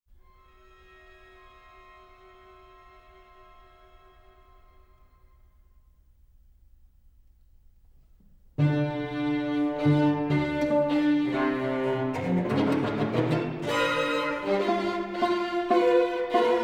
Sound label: Quartet